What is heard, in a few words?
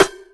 Percussive